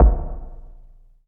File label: Kicks, Elektron MachineDrum SPS1 MKII